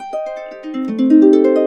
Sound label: 70, Complete Harps; USB Soundscan vol.62; AKAI; KUBLA 70